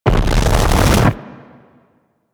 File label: Eruption Build Up; Sargas Ruk